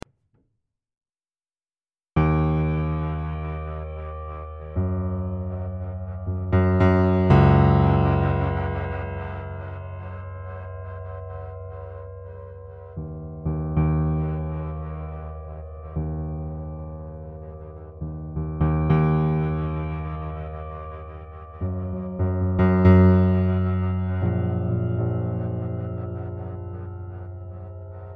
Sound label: 59 notes, Erard, Pianoteq